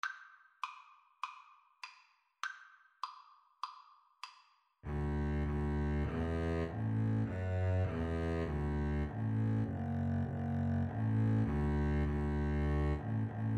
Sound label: Double Bass Duet